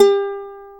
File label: UKULELE G3; AKAI S-Series CD-ROM Sound Library VOL-7